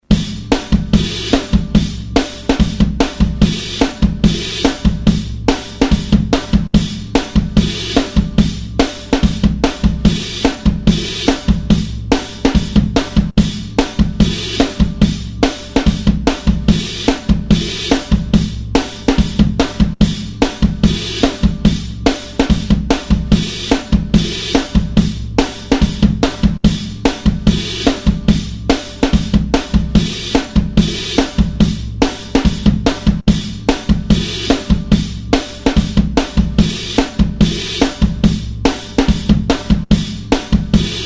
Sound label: drums